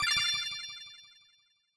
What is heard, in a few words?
Crystal spawn; Super Mombo Quest